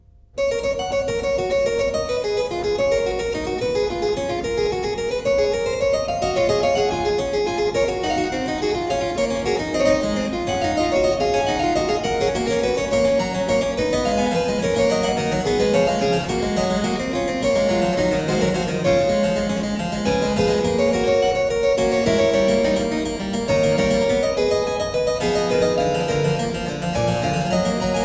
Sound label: Prelude & Fuge in G major, Keyboard; No.15; BWV 884; Johann Sebastian Bach; The Well-Tempered Clavier; II Fuga